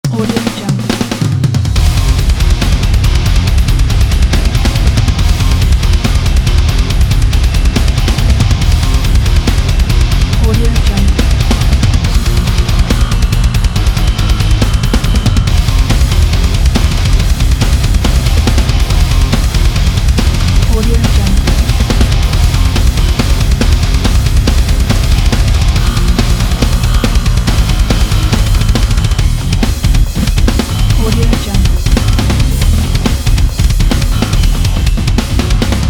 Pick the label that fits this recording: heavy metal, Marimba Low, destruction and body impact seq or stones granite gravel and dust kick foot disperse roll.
heavy metal